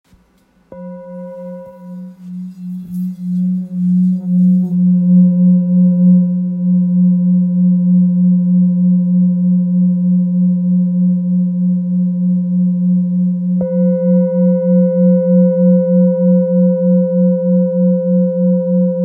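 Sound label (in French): Fullmoon fa diese, bols chantants tibétains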